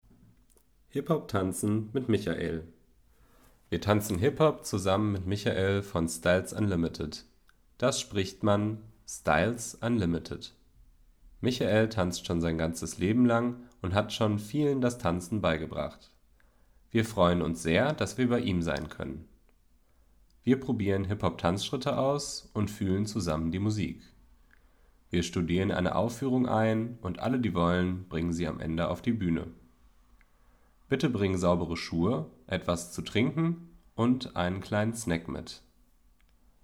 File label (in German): Hip Hop